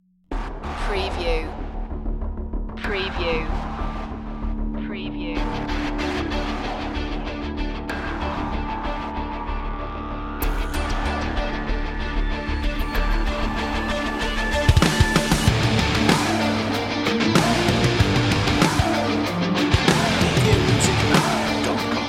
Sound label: white noise